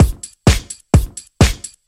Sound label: Housebeats, 128BPM; Vengeance Samples; VEH2 Loops